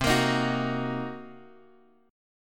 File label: C Minor 7th Flat 5th Guitar Open D, chord